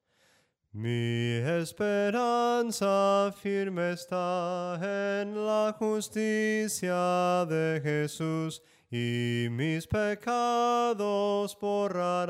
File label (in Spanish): SOPRANO CANTADO, himnos en melodia soprano